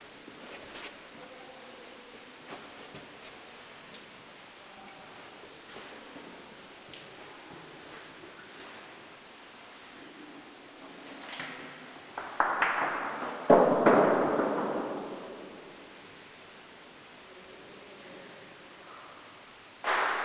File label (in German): steine werfen